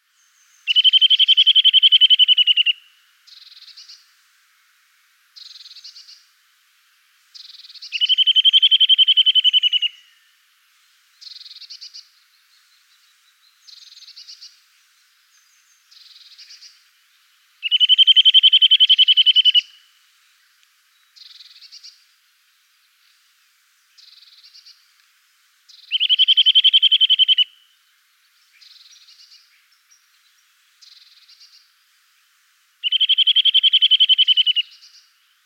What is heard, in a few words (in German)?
Kleiber Gesang; Wiesloch